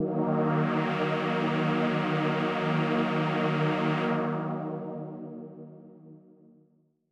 Dramatic D#3; KG MegaSaur

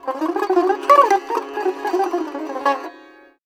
SITAR; Spectrasonics; Heart of Asia

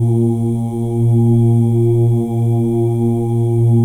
M+F OO A#2; Spectrasonics; Symphony of Voices; M+F OOS

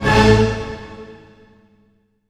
HIT, orch hits; Orchestral Loops

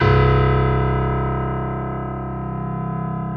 PF ST C 0

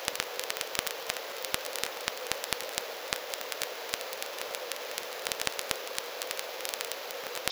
vinyl; Masterbits; Vinyl Attack

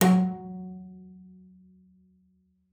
BBIZ F#3 -R, BASS PIZZ; BASS PIZZ 2; Xsample VOL-5

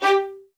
MED ATT G4, soft violins; Q Up Arts; Denny Jaeger Master Violin Library

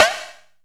Shock Snares, Percussion; Dance Synths

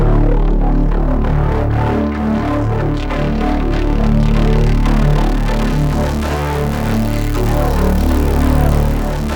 MORPHUZZ, Synthy Tonal; Distored Reality

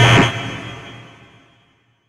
SHORT, SHORTNOIS

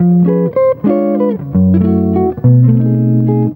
EGUIT ARPEGG; Best Service; World Colours

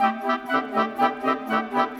Winds, Orchestral; Sonic Foundry (Sony Creative Software); Orchestral VOL-2; Modern 20

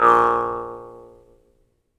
jaw harp, Pitched Percussn, Jaw Harps

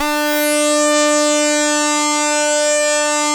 saw res dry, synth

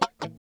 CHUCK KUH, FUNK CHOPS, guitar; Spectrasonics; Hans Zimmer Guitars VOL-1

